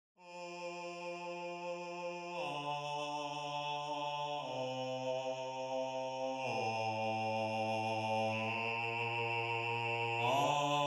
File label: Bass